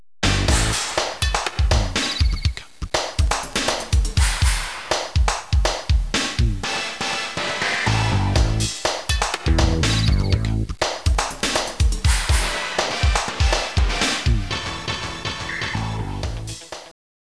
house

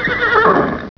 HORSE, 家畜, 马; 动物; 特酷声音素材库

